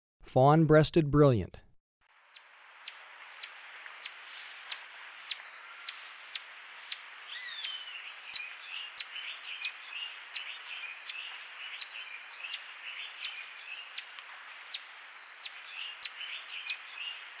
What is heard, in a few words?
Fawn-breasted Brilliant, Birds of Ecuador; S. America